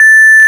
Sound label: FM Bells; 90s Sample CDs; Club-50 - Foundations Roland; FM Syns